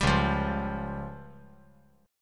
C sharp Major Flat 5th; C#Mb5 strummed; JGuitar

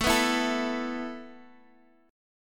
A Suspended 2nd down Guitar DADGAD

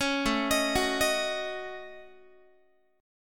A 6th Flat 5th A Guitar, chord; A6b5 arpeggiated

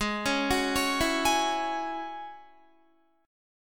Ab 7th Suspended 4th Sharp 5th down Guitar; Ab7sus4#5; arpeggiated; 4F,4A,5C,5G,5C,6E; chordshape; JGuitar

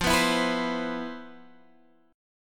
C Major 7th, F, guitar chord; Cmaj7/F; strummed

